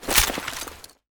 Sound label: AKM Draw